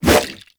Sword Swipe, Whooshes

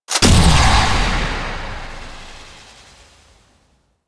Arbitration Player Revive; Warframe

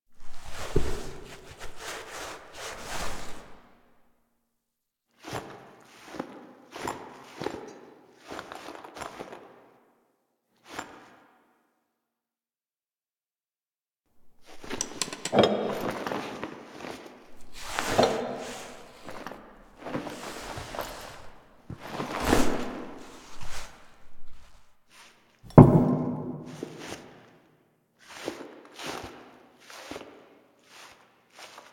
Foley; Zariman; Table For Two

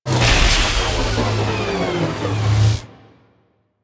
Rod Electric Power Down; Warframe; Ambience; Corpus Gas City; Gameplay Remaster

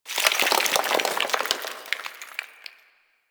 Falling Sand Rock; Ambience; Man In The Wall

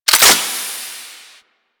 Rail Jack Rifle Reload; Warframe; Tenno

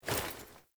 Foley Action Punch, Default Clothing

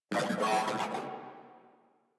Moa Vocal Enemy Spotted; Enemies; Warframe

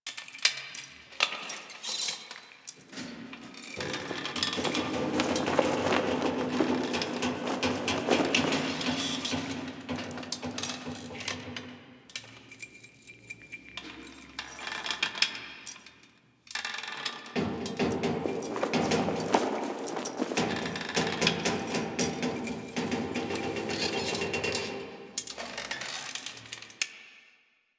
Camp Ambience Loop; Props; Loops; Grineer; Warframe; Eidolon Plains

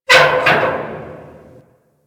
Derelict Metal Clunk Squeal; Ambience; Props; Warframe; Orokin Derelict

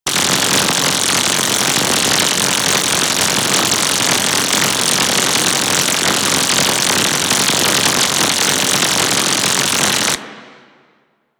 Spectra Beam Loop, weapons

